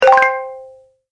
Deep Bonus Win, Video Game Sound Effects

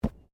Body movement Organic Impact Light

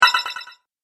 Gone Spell, magic

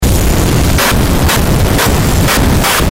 Distortion Long Glitches Hiss Static; Blastwave

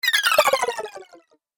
Aqua Spell Magic; Magic Sound Effects

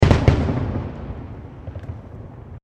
Fireworks Multiple Explosions

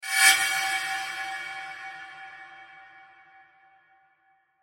Metallic Scrape Short Heavy Reverb; Metallic Sound; Blastwave

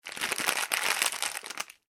Plastic Packaging Movement

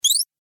Multimedia Squeaky Beeper; Business Programs, Apps And Computing Noises; Andrew Fleming

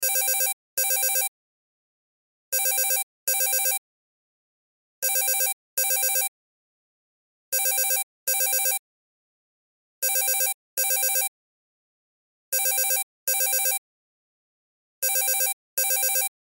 Cell Ringtone

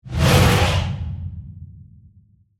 Whoosh Aggressive, Magical, Transition; Production Element, Imaging Element, Accent; BlastWave

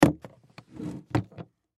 Case Set Down, Case Set Down Luggage; Case Set Down Lay Flat Luggage; Set Downs; Foley

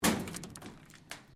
METAL SHEET MOVEMENT; Metal (Sound Effects:Materials)

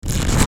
TRANSITION, Transition Page Forward; Transition Page Turn Several Forward; Multimedia; Blastwave

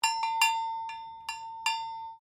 COWBELL 1800S FORGED RINGING, Old Cowbells; Musical

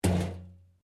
Pipe Tin Move; Material components to create more complex sounds; Materials; Metal

